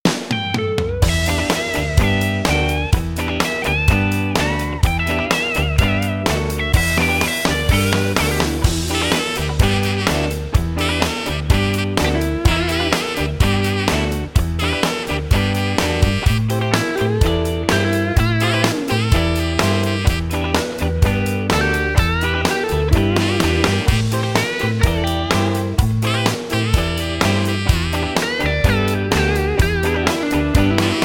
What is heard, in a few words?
instrumental